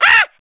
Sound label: Monkey boss Hit; angry birds rio